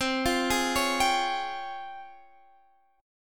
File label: C sharp Major Sharp 11th C